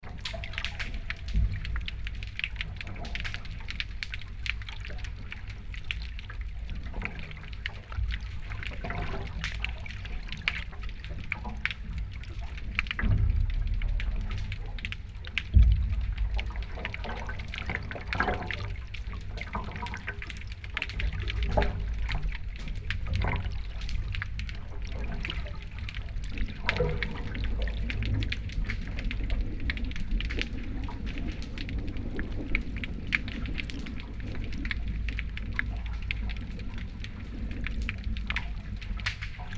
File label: West End City Cat Shrimp